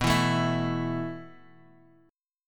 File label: B 7th Suspended 4th B down; B7sus4; strummed; JGuitar